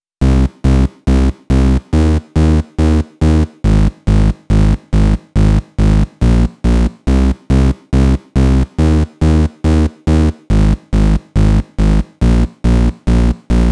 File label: Bass Mix, 140 BPM; Pitched; Vengeance Samples; Vocal-Hook Kits; Song Kit; Break The Walls